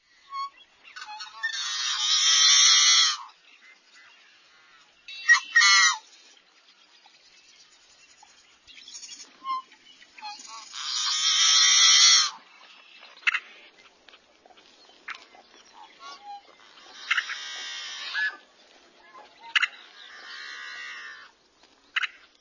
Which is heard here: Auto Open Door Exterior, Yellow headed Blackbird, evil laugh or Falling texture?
Yellow headed Blackbird